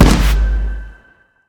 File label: hit; smite; god scylla